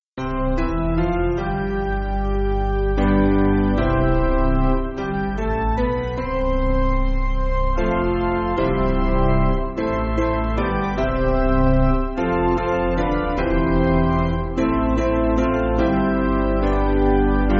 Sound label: Hymnary Piano